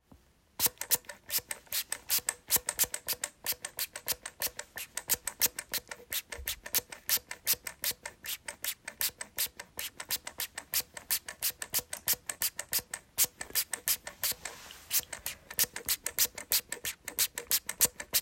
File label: Spray bottle